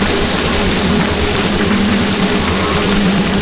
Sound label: thermal charge; noxian; LoL; urgot